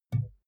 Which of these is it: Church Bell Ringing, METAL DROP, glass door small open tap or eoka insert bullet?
glass door small open tap